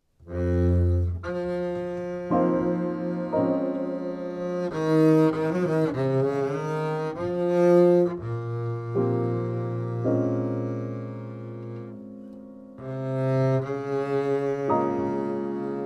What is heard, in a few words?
Improvisation